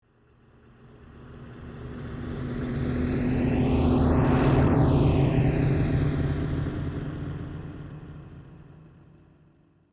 Industrial semi truck pass by distant perspective

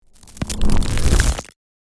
Energy flow fast particles movement

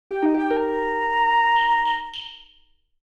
Mobile game fantasy level up

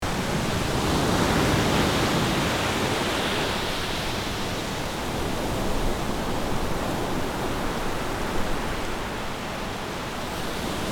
Strong sea wind and waves ambience; ocean

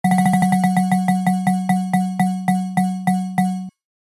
Spin to win; shorts sound effects

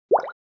Water bubble pop

